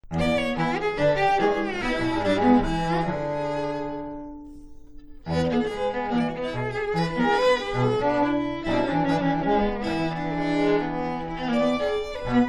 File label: string trio